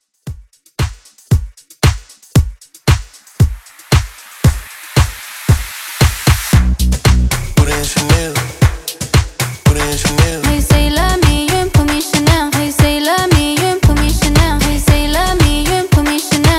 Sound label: Re Drum